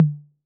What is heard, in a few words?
Tom Mid B 808, Clean, Digital